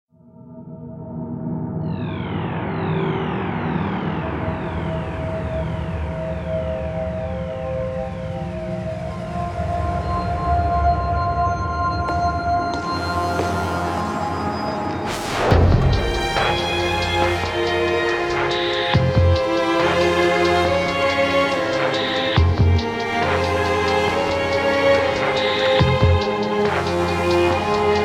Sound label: continuing suspense